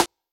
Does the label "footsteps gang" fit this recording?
No